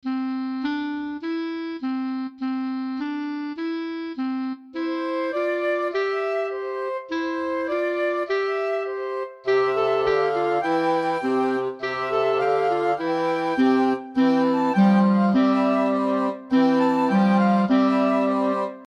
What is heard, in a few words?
Frere Jacques round clarinet only